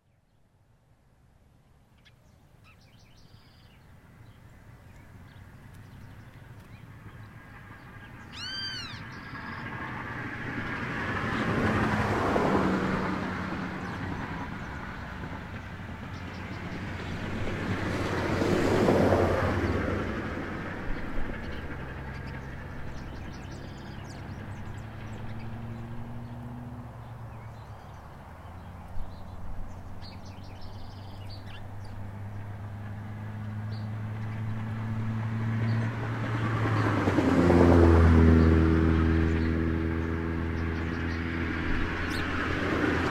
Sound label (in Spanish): rp19 acceso; san jeronimo norte